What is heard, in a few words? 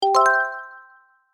Gentle delayed bell alert